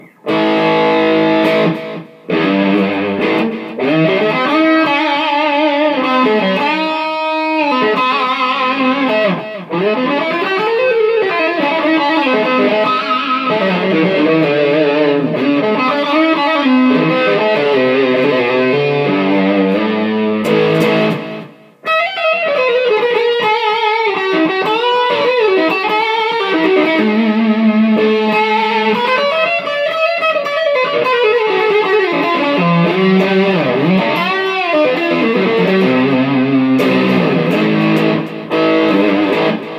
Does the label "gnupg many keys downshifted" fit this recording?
No